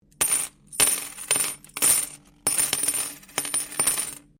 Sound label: Coin Penny Multiple Drop Laminate